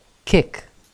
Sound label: kick